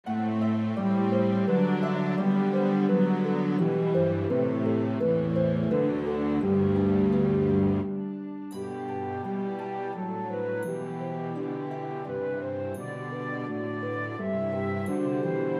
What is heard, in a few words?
Flute Track with Melody; Away in a Manger